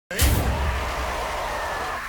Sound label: monster